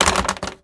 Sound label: Toilet Paper Dispenser, Bathroom; 1001 Sound Effects